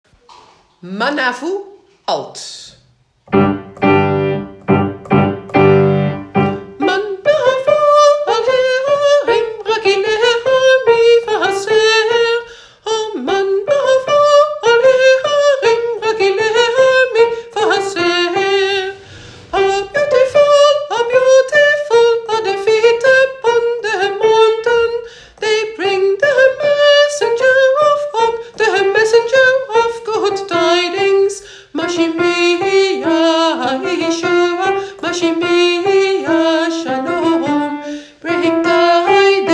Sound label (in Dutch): ma navu alt